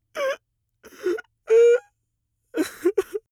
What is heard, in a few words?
male crying; soundpool